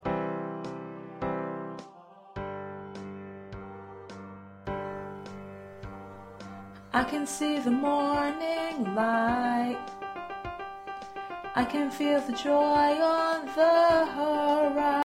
Lead

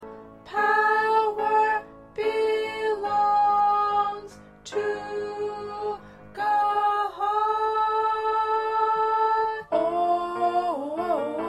2nd Alto

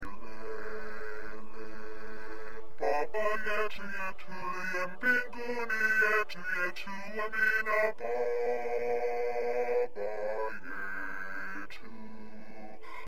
2nd Bass

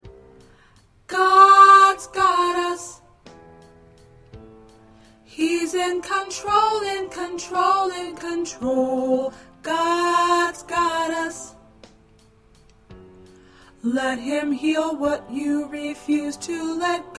Alto

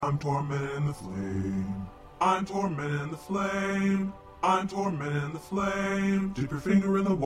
Bass 2; SATB; rituals and congregational songs

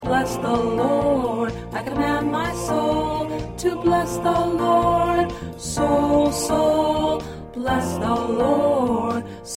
Alto, choir parts

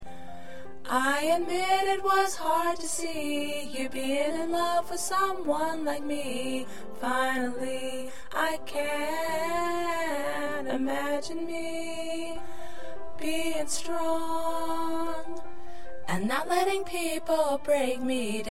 Alto; gospel songs; PRACTICE TRACKS

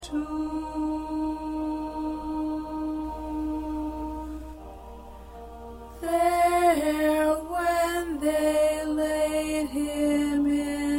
Tenor 1

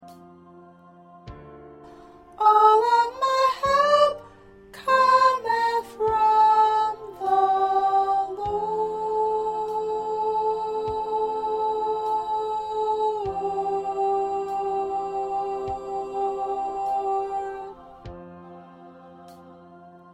2nd Soprano

